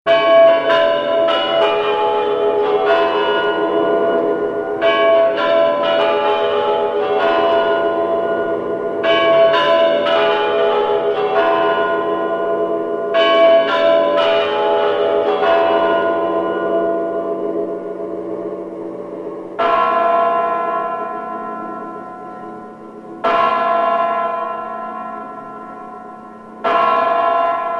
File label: cremlin curants; noviygod